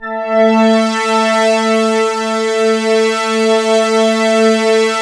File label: MXWALL, MATRIX 12, Vintage Keyboards; Big Fish Audio - First Call Vintage Keyboards